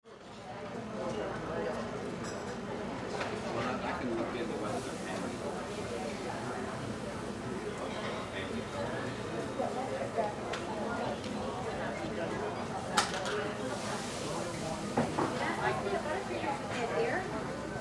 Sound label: Cafe Coffee Shop People Chatter; Restraint Cafe Coffee Shop People Chatter; Restaurant; Ambience; Restaurants; Blastwave